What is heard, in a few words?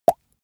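plop water drop, body sounds; koerpergeraeusche; menschen; soundpool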